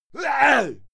male fight attack; soundpool

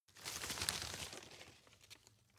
grab rustle short soft; berufe; foerster

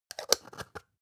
spice jar scratch close; industrie wirtschaft; gastronomie

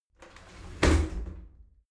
shower slide doors close heavy; objekte; tueren

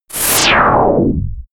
fire charge, zaps and buzzes

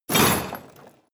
crash medium chain smash

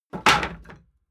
wood board drop rumble; objekte; holz

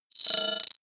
sweep rattle click; sweeps-swells

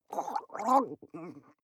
water monster female gargle blabber; koerpergeraeusche; comedy-cartoon; soundpool

